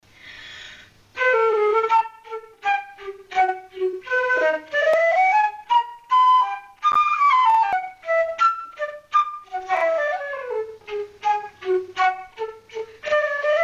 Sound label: Flute Solo Only; Solo Accompaniments; Gavotta From Classical Symphony